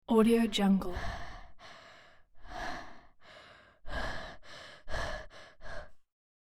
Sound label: child breathing intense exhausted; human sounds